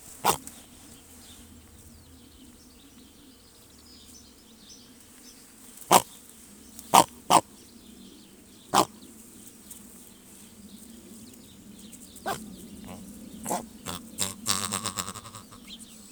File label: Great bustard